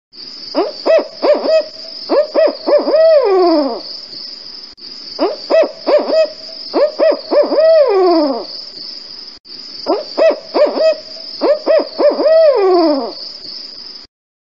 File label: Barred Owl Call